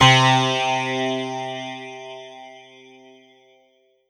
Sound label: Yamaha TG77 Clavinet C3